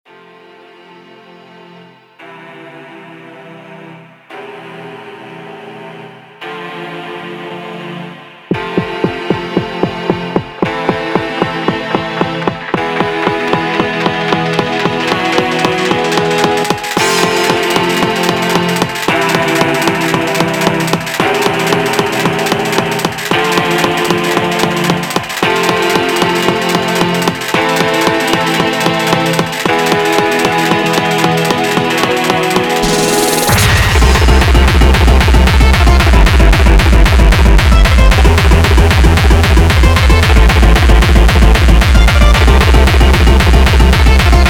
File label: lolicore; Splitterkor Rekords Dziwko